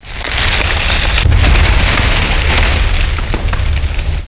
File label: quake